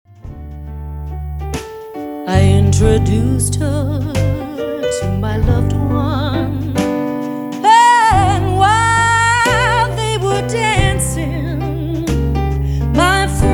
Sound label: waltz